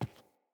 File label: Boot Walk Wood, Footsteps; Characters; Enemy; Boots; Sinecure; Melee Grunt